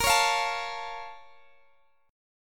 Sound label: A sharp Minor Major 7th, down, Guitar, Open D